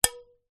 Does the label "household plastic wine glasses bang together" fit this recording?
Yes